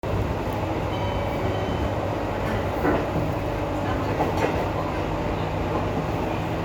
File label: Chime